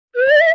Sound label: Slide Whistle Short; Vintage Cartoon; Musical Elements